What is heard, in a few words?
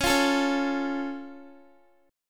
C sharp Augmented down Guitar, chord; strummed; tuning=4E,4A,5D,5G,5C,6F